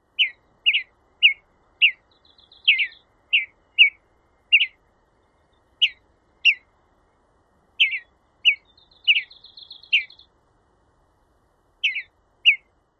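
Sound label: calls at dusk, mountain bluebird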